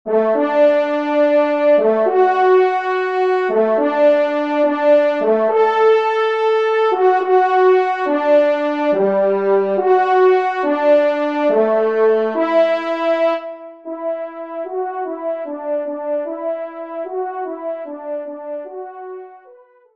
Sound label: Elevation; messe de Rochard